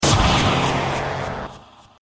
blaster; UT

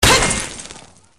hit; freak fortress 2; darklink

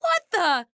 sniper negative vocalization; Kinessa

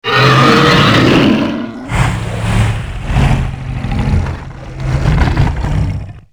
stun; tera creatures; alpine predator

